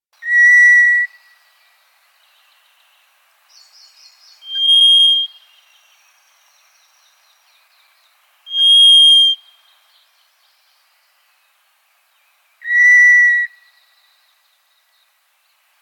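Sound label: White's thrush voice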